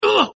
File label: hit; mondo